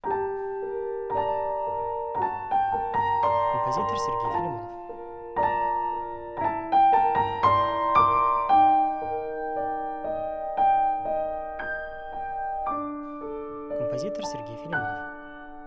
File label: Sad piano